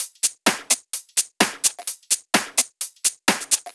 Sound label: Drumloops 128BPM